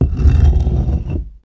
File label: Stone Door